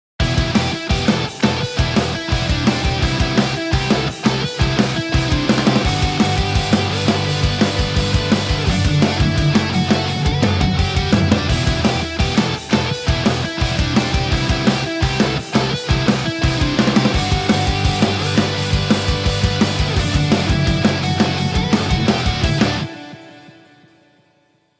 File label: Adding Lead Melody Harmonies